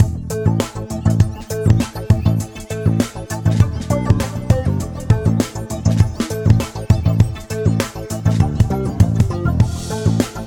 drummer

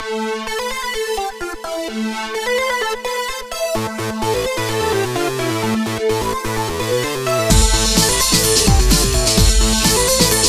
breakbeat

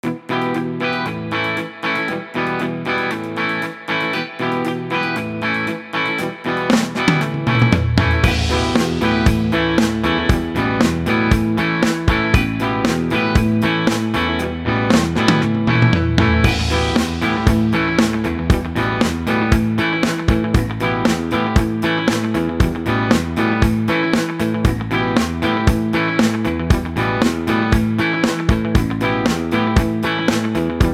relaxing

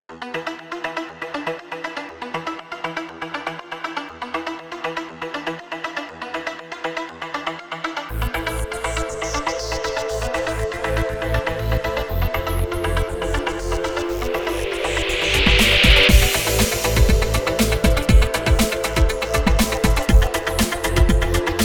truck

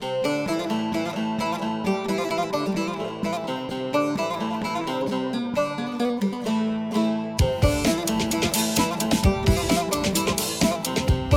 baglama

